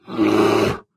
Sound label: bear idle; animalsvotive; Minecraft; Votive Content